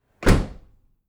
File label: trunk close; transportation